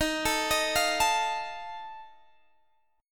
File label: D sharp Suspended 2nd Flat 5th D sharp, Guitar DADGAD; x,18,19,20,20,19; chordshape